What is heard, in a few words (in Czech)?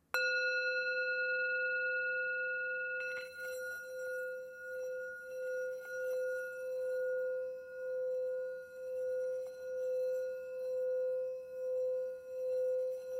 tibetska misa; Tibetská mísa Go malá; palička na hraní; Obrácený zvon, meditační mísa, léčivá mísa, himalájská zpívající mísa, tibetská mísa